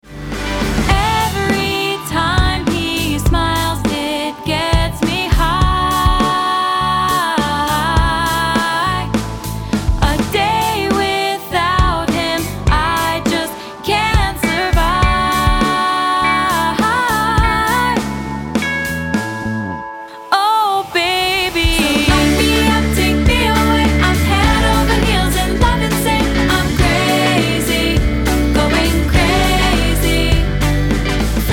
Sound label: POP